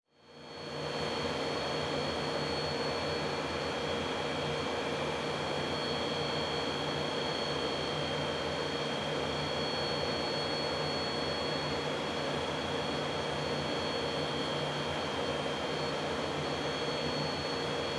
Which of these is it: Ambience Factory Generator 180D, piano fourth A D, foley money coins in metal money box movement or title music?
Ambience Factory Generator 180D